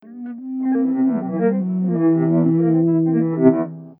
Ebow; Hylics

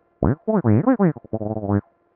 Vocal; Hylics

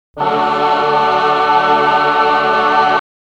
Sing; Hylics

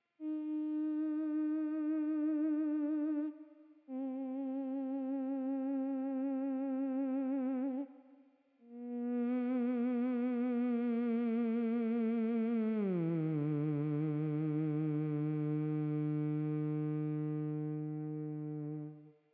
Theremin; Hylics

